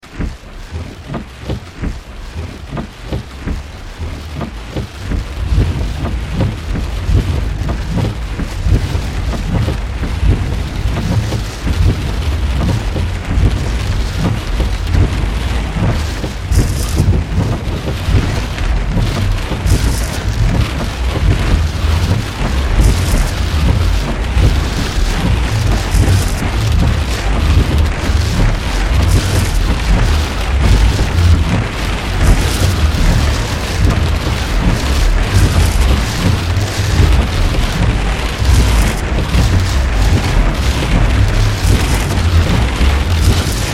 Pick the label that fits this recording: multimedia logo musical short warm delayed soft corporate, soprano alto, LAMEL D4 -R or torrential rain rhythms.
torrential rain rhythms